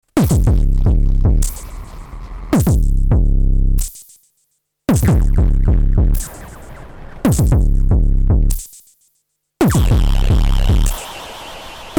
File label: Soma Pulsar 23 Patch Diode